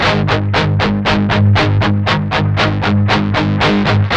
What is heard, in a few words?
8TH A, Guitars; Spectrasonics; Hans Zimmer Guitars VOL-1; 128-JACKHAMR